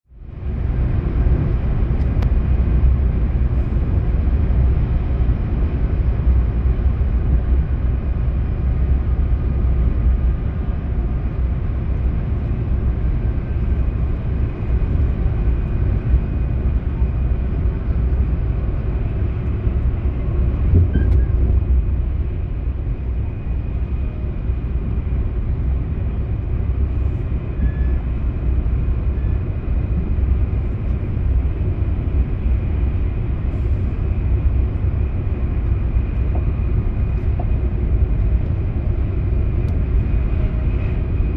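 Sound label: high-speed train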